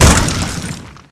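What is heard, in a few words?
brute impact medium, vehicles; Dystopia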